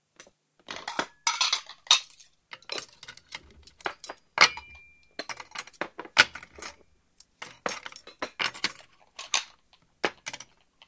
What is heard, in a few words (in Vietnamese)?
Tieng don dep chen bat dia sau khi an xong